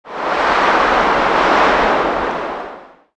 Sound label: wind gust; Garry's Mod; tf2